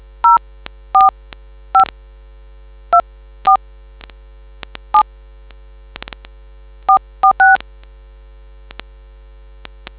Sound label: dtmf